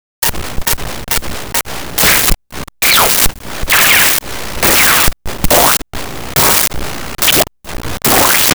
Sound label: Cartoon